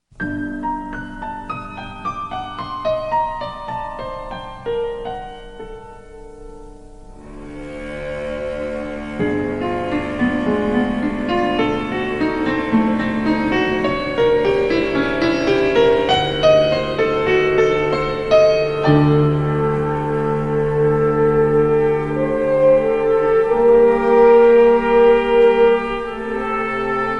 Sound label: walking In Rain